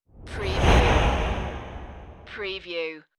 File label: Rush